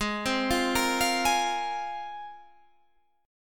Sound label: Ab 6th Add 9th Ab